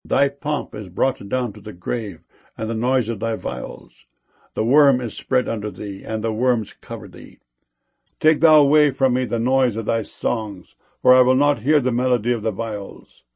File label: viols